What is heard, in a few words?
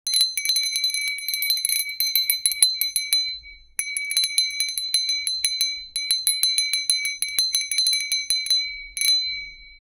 kids friendly bell sound